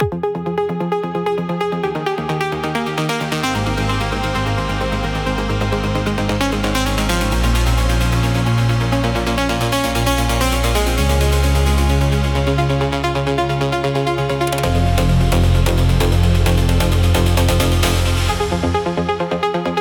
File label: Neon Drive 130BPM